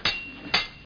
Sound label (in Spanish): ESPADA